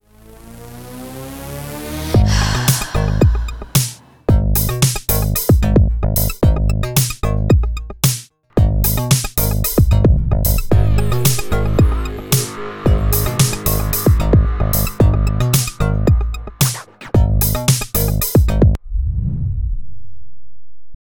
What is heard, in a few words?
Fashion Ident